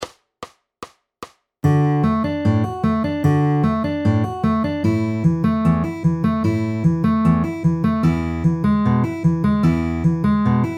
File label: D A E A pinch ring ring with alternating bass at 150 bpm